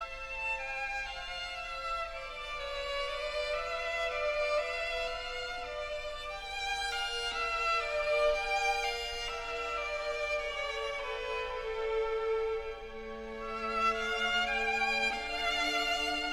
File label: Violin Concerto in A Minor; Vivaldi